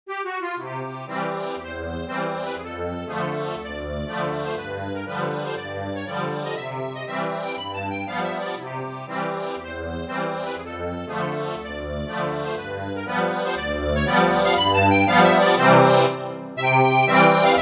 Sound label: accordion